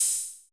cymbal, Roland MC-909

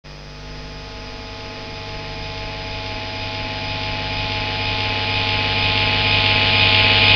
Ground, Industrial

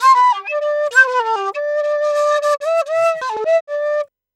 Penny 98 bpm G, Penny Whistle, African; Quazibeat; African Secret

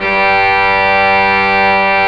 REEDS G2, ORGAN; AKAI S-Series CD-ROM Sound Library VOL-8

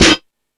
Crash, Drum Machines E-MU Pro-Cussion

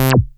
SHORT ACID, BASSES

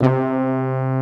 SYMPHONIC BRS

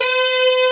SAX A2; AKAI S-Series CD-ROM Sound Library VOL-2

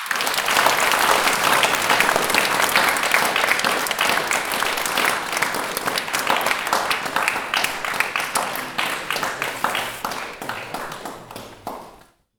Crowd Effects; Vengeance - Essential Clubsounds Vol.4; 140BPM

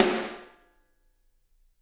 SD, Drums; Bob Clearmountain Drums I

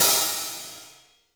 hihat - opened; JazzFunkKit

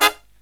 HI CHORD, Brass; USB Soundscan vol.29 - Killer Brass Riffs; AKAI; HIGH CHDS

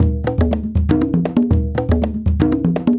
African Drum 75 bpm; Quazibeat; African Secret; African Drums; 80 bpm

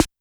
Snare Analog, YAMAHA RM1X

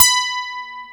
12 STELAG C5, EL-AC12; AKAI S6000 CD-ROM - Volume 1

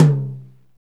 TOM, Hip-Hop Kit; Northstar - Drumscapes Roland

